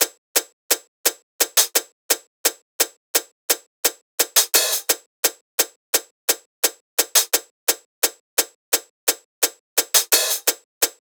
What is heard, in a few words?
hat loop 86, Drums; Pop; Hat Loops; wierfield; Slate; SD PASADENA Pop Sample Pack